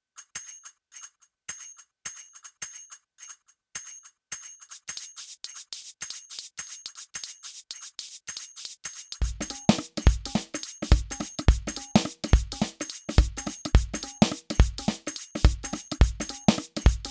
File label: Drums; Spectralis SampleLibrary 2